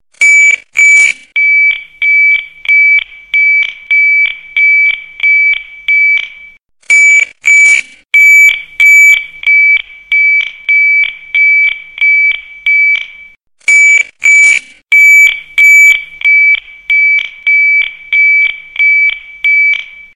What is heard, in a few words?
Alarm Classic